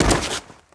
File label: bodyfall stone, foley